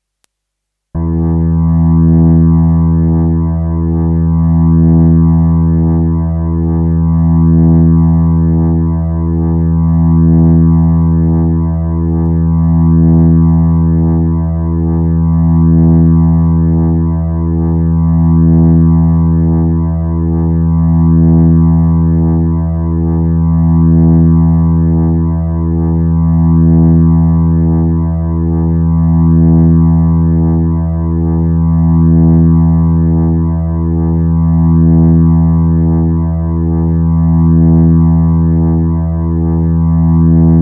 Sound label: Sixth String E; synthesised notes, each about a minute long, for you to check your guitar tuning; 6th string E